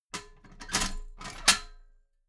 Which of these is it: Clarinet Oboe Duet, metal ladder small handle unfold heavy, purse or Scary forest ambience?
metal ladder small handle unfold heavy